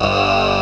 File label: BIG TALK, BASSES; Pro-Rec - Synthsonic